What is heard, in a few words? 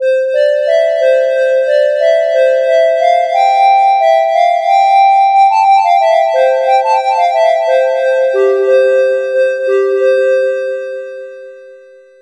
two tiger echo, syn music; 迴音(0.25s); 合成音樂(Synthetic Music)